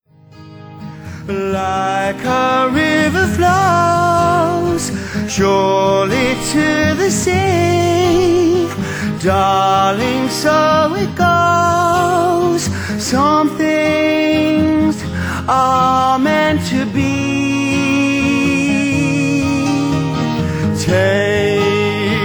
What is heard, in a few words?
acoustic